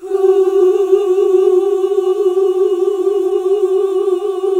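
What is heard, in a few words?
Gospel; Best Service Gigapack 1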